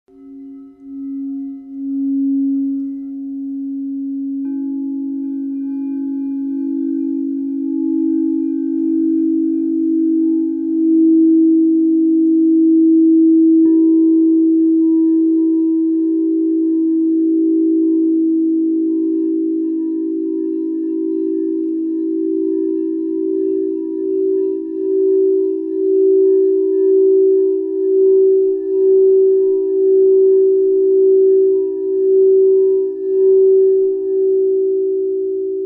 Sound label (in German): kristall klangschalen kirche ohne gesang